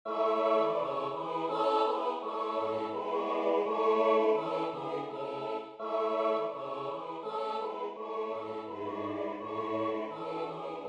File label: deck the halls